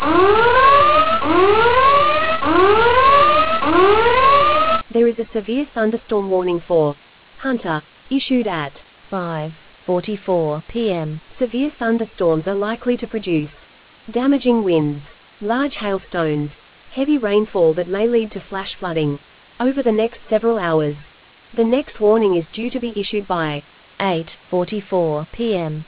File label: storm audio